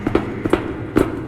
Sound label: footsteps metal; We Were Here Forever